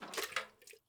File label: waterbucket throw; Rust